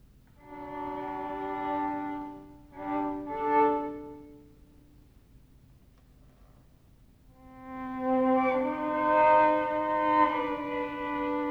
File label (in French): Cinq poses pour violon seul